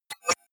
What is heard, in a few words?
hover fast, signale; soundpool